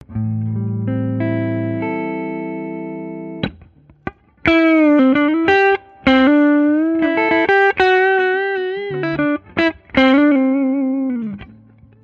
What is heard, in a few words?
deluxe lp gold top